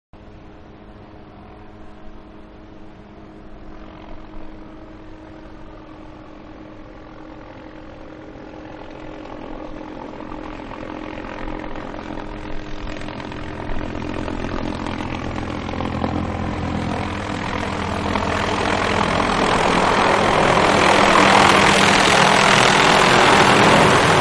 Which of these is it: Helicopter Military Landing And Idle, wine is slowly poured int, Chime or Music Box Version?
Helicopter Military Landing And Idle